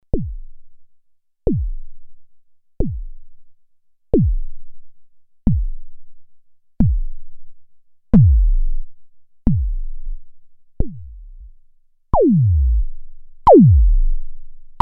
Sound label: ACL System 1 BD